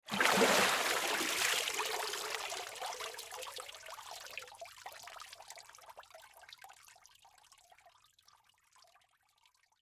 Drips Body Stand Up, Water Drips Body Stand Up Splash; Dripping; Blastwave